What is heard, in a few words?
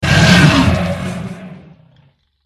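lizard griffon attack voice middle; yasherogriffon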